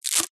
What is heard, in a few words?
office sticky tape remove peel